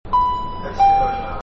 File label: door close